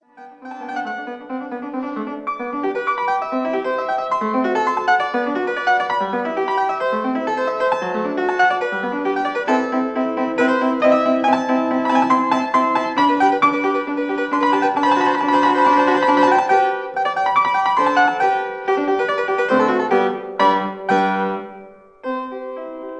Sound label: Piano Sonata Allegro; Mozart Piano Sonata No 16 K545 mvt 1